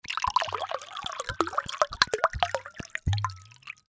bottle fill glass

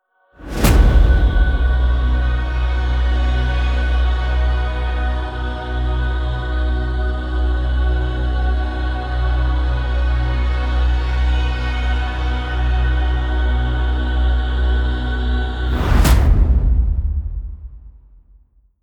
promo pad

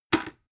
bucket handle drop

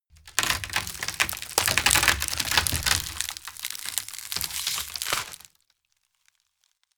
tear

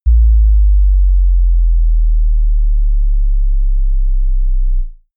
bass drop soft

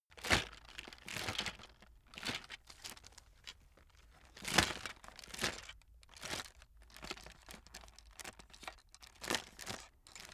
chocolate box handling

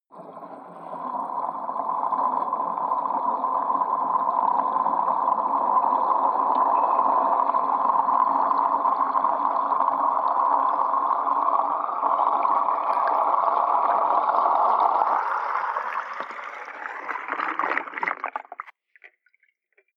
sink underwater fill

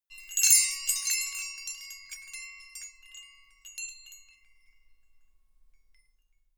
windbells ringing

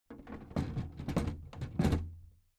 big plastic case lid put down soft

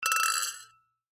toasting with glasses

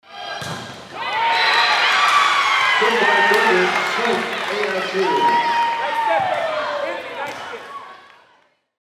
volleyball match

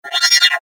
harmonic event

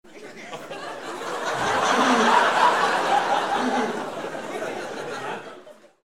laugh long

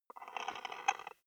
wood board scratch

